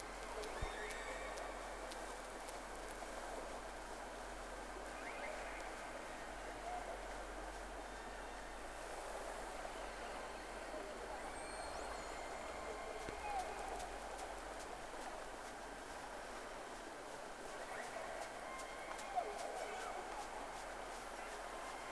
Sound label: Whales; SeaSnake